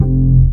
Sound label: DM BAS, Dance Mega Synth; Best Service